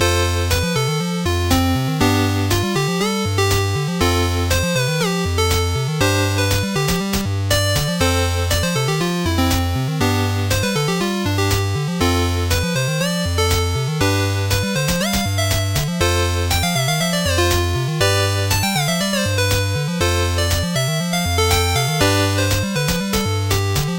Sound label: Menu Song